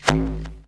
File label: Rubber; Jungle; FX; Blu Mar Ten - Jungle Jungle - 1989 to 1999 Samplepack